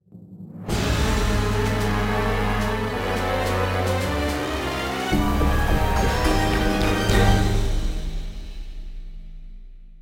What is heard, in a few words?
Lose; modern combat 3 fallen nation; android